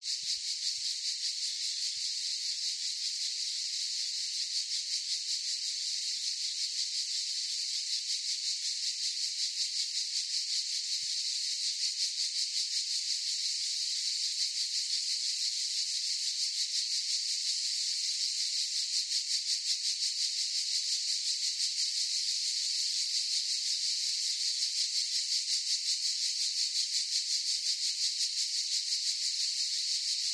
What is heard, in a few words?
CICADAS Forest Chorus; gain walkers